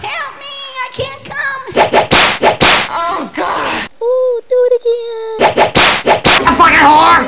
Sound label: good whip